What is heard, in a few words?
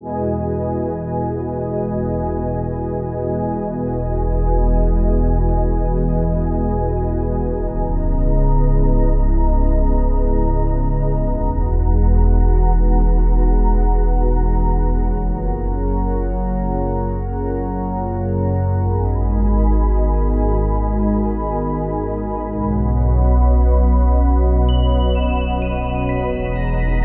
Confession (Instrumental)